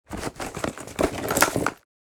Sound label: Leather purse searching and rummaging; Wallet and Purse